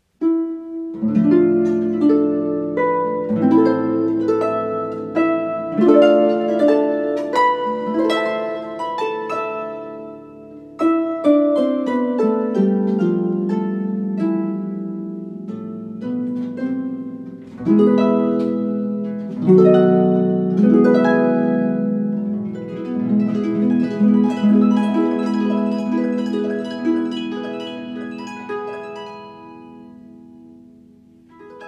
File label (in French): Fantaisie pour harpe